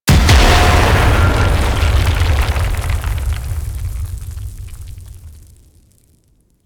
Death Impact; Enemies; Abilities; Teralyst

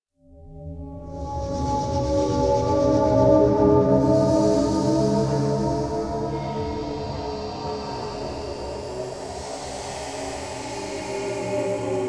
Dark Ghost Swirl; Ambience; Exterior; Warframe; Railjack

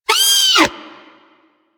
Snow Critter Vocal Pain; Warframe; Hunting Critters

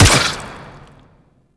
Infested Burst Rifle Shot 2D, weapons

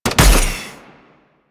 Shot, Weapons; Warframe; Tenno; Miter

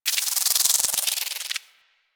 Tech Movement; Ambience; Warframe; Corpus Railjack

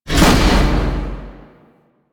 Bash Cast Release; Warframe; Warframes; Hoplite

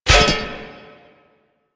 Disc Land; Warframe; Duviri; Glyph Puzzle

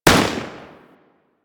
Fire, Rifle; DE; Warframe; Weapons; Sentinel; Deth Machine Rifle

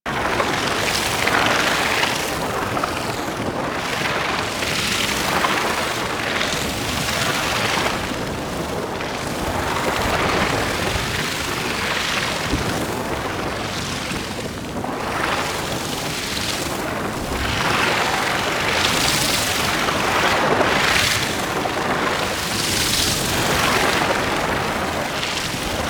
Fusion Pillar Energy Loop; Warframe; Warframes; Qorvex

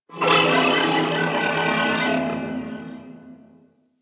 Ship Data Chatter; Ambience; Warframe; Corpus Ship Remaster

